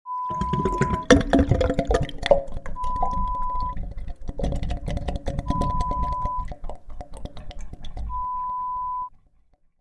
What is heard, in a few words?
Gurgling sound